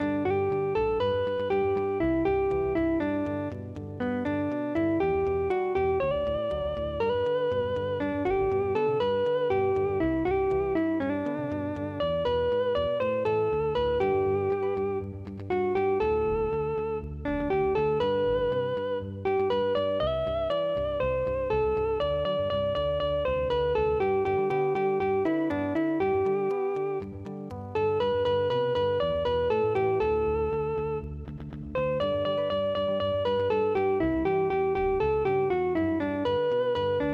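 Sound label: hawaiian; picnic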